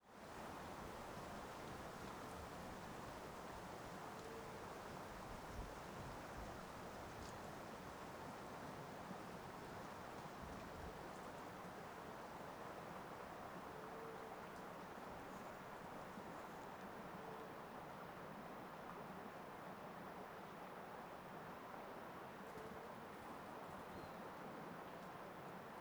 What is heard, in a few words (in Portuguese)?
Ambiencia na Beira do Rio Simao Correa